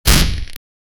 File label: soft lightning zap sound; sound design